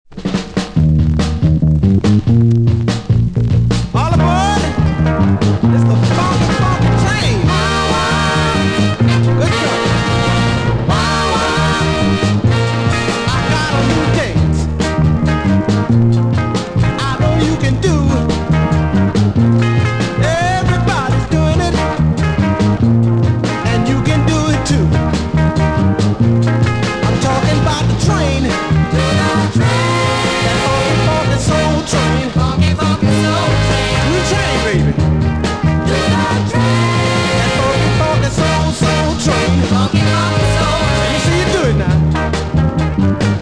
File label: Funky Soul Train